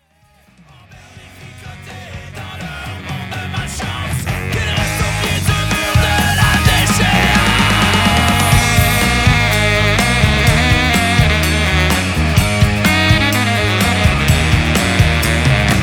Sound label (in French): Le Singe Solo